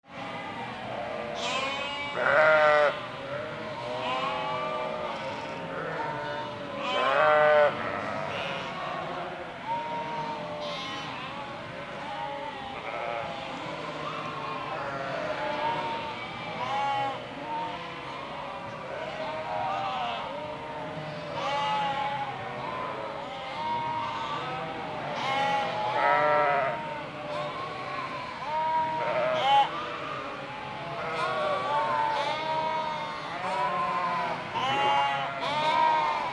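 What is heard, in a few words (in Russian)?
овцеферма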